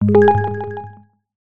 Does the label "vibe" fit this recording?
Yes